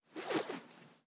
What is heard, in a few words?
Twirl Smol, organic wooshes; Micro Pack